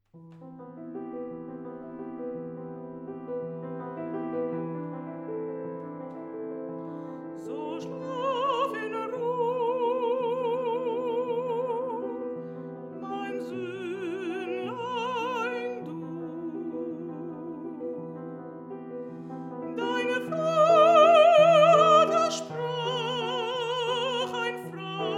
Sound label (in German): Wiegenlied